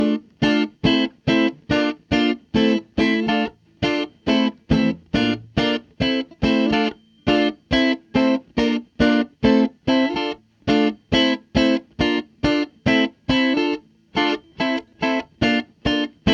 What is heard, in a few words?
Vox 710